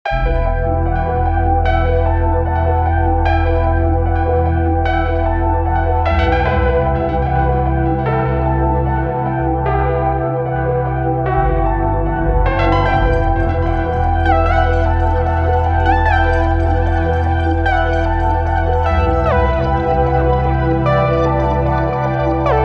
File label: trap; s2 fragmented futur3; 2 8 expansion trap pack bundle purple edition